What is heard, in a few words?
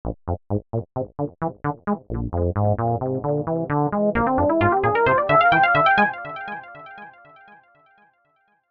SYN SAW; eShop